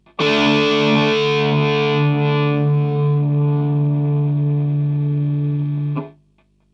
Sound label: ge200 mesa